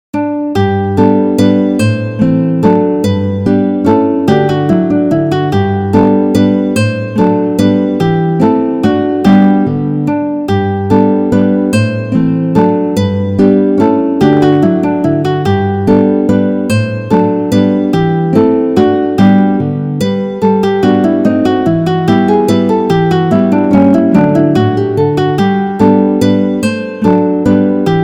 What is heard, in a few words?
Menuett Mozart guitar